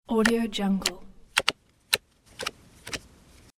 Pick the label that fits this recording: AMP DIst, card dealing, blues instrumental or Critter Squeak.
card dealing